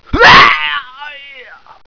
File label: die; wartso; barney